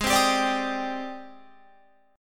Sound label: Ab Major Sharp 11th Ab, Guitar Open F; strummed; 20,19,19,21,22,22; down